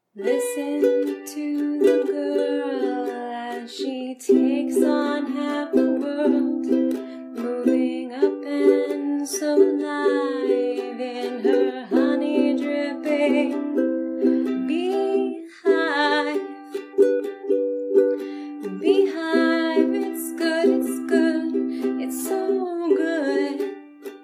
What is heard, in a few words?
[F4A] [singing] [me badly playing ukulele]; you've been warned